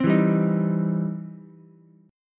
E Minor Major 7th Sharp 5th, E, down, Ukulele; EmM7#5 strummed

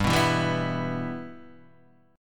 E Minor G, Guitar

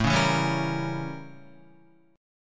A 7th Flat 9th A, Guitar; A7b9 strummed; JGuitar

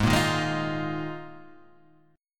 D sharp Suspended 2nd Suspended 4th G sharp

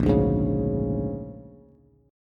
Bb 7th Sharp 9th Bb down Bass; Bb7#9 strummed; JGuitar

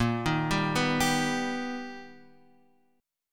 B Augmented Major 7th A sharp, chord

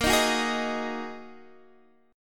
Bb 7th Suspended 4th Sharp 5th Bb Guitar DADGAD; x,13,13,11,11,13

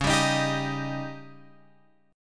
Db Suspended 2nd Flat 5th Db down Guitar Open F; strummed; JGuitar

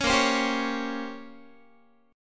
Bb Minor 6th Add 9th Bb down Guitar; Bbm6add9 strummed

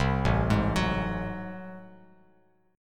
A Minor Major 13th A, Bass Standard; arpeggiated; AmM13; JGuitar

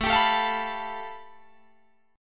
A 7th Suspended 2nd Sharp 5th A, Guitar Drop D

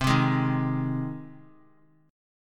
C Minor Sharp 5th C down Guitar; strummed; JGuitar

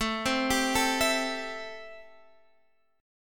A-Minor 7th A; x,12,10,12,10,12; arpeggiated; Am7

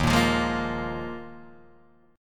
Eb Suspended 2nd Suspended 4th, Guitar, DADGAD

